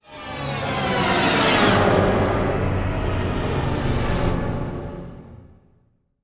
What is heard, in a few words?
Galaxy Tone Slide Down